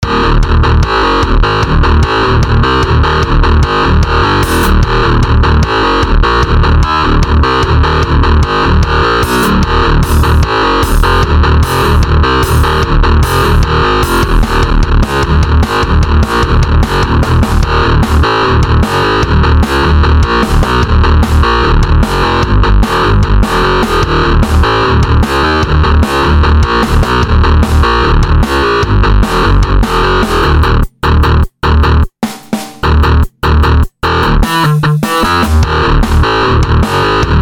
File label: bass git